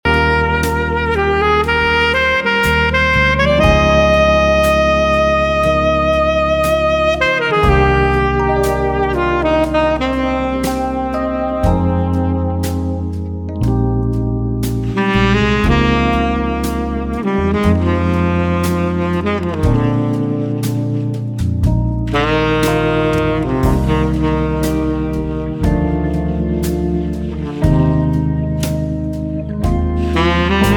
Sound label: JAZZ, ジャズBGM; Lyria 3; Gemini